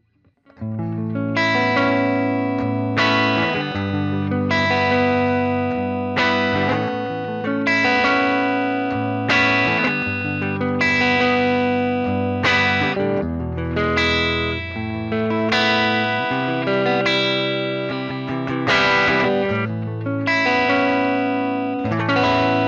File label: JCM Clean ES335